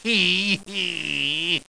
Ah Laughs; Bible Fun Pack; Education Publishing Concepts; NOAH

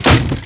collision lg; SpecOps2 MPlayer Demo

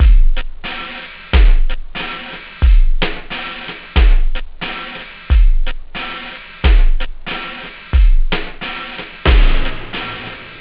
house; OctaMED SoundStudio V1

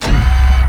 BUTTON; MECHWAR

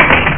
hit barrel; Russian Roulette 1.1.1

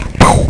pow; Space Siege

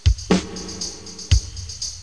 load; Bible Fun Pack; Education Publishing Concepts

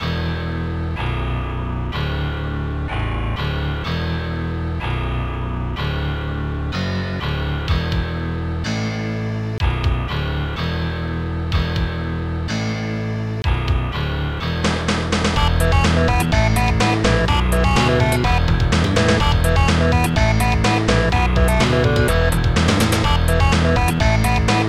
disco; The Module Collection 1

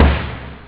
nature, snare; OctaMED SoundStudio V1

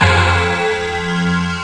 power chord; Sound Effects for Multimedia - Volume 1

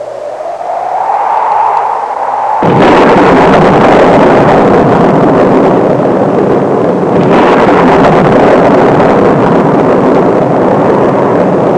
wind and thunder; Sound Effects for Multimedia - Volume 1

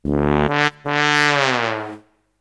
tuba; MOBICLIC

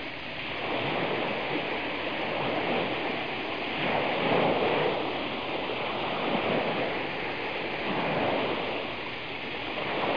WATER SOUND

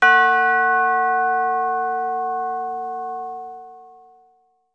chime; ULTRA GAMEPLAYERS - Disc 104 - Dec. 1997

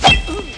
BONK; ULTRA GAMEPLAYERS - Disc 104 - Dec. 1997

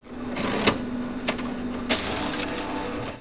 mg printer

